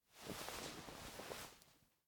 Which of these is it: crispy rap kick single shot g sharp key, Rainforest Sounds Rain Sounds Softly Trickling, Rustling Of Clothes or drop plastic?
Rustling Of Clothes